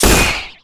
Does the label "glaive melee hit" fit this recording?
Yes